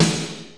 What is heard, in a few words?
snr